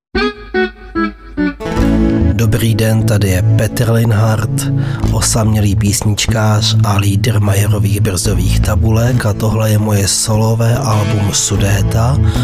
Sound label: country